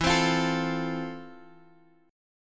F Major 7th E

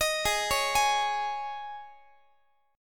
G sharp Suspended 4th G sharp, Guitar; arpeggiated

